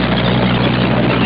tank

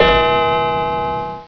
MANTL CLK; BELLS

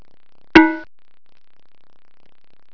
COWBELL

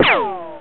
Plasma Hit; marathon

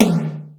POP TOM; BEAT2000

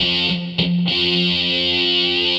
GUITAR, ROCK POP; MAGIX

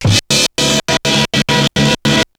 TREM SHT; BEAT2000

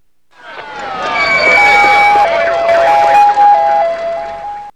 AMBULANC

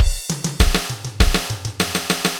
FILLS, ROCK POP DRUM; MAGIX

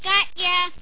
KIDS; KRONDOR

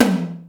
HIJAZZTM, DRUM; BEAT2000

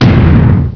MISSILE; ARMRCMD

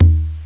LO DRUM

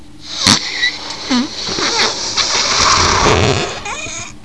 blow up

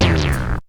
BLAP; BEAT2000

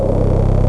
ENGINE

